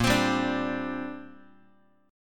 A sharp 6th Add 9th, Guitar; A#6add9 strummed; JGuitar

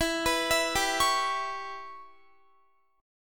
E Minor 6th E, Guitar; Em6; arpeggiated

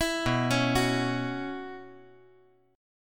B Suspended 2nd Suspended 4th

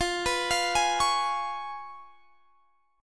C sharp 7th F, chord

